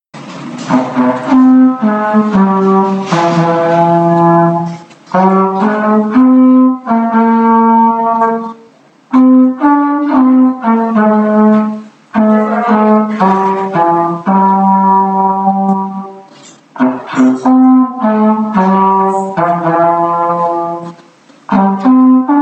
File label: trombone starry night